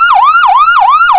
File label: siren